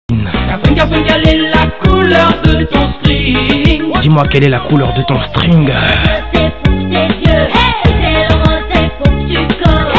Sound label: string; Club Med Gregolimano